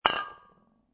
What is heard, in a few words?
Foley, Aluminum Spray Can Being Placed Down On Concrete; Set Down